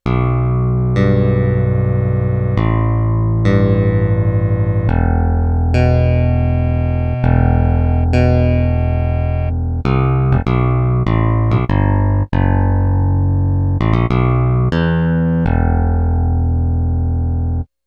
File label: Picked Bass